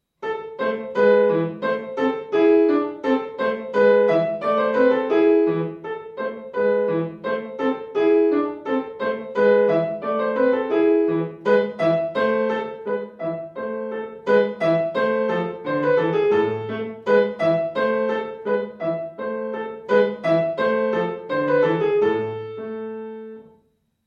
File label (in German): Gavotte, Klavier; Witthauer